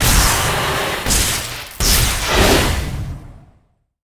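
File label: Boss Mech Naga death, monster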